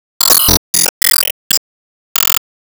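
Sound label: Sound Effect System Rate down; Se Sys Topleague Ratedown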